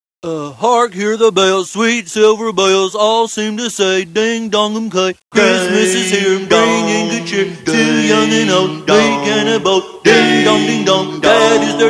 mrm bells; cstrike; lethal; xmas

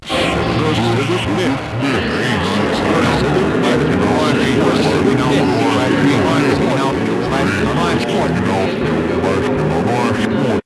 statue; svencoop

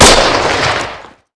garand shoot, weapons; dod

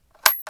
sniper bolt back

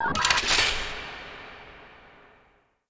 trigger

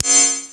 hud nightvision; svencoop

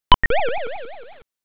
plunk; firearms; frogger

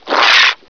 headcrab attack; gearbox

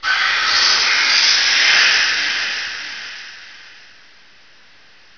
creature

